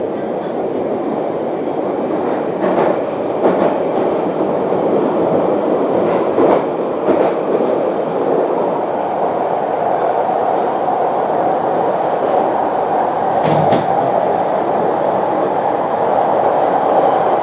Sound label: London Underground